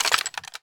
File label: overheat manual cooling reset foley generic; reload